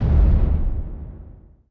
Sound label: door open